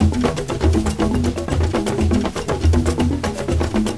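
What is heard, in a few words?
drums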